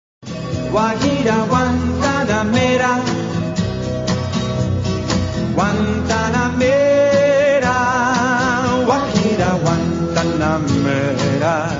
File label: Examples of I-IV-V